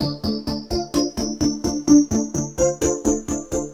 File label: VFX2 Loop 128 BPM